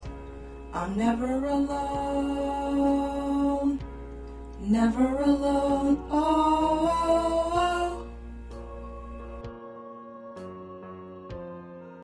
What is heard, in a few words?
Tenor, choir parts